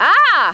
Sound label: Aah!